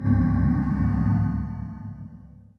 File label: shadow storm cast; bittering rancor; boss